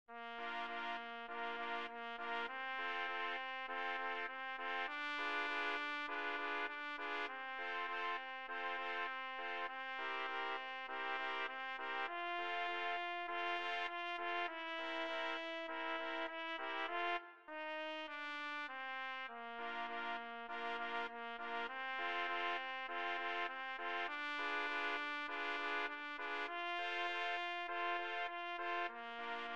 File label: Trumpet; Martin Schmid Blechbläsernoten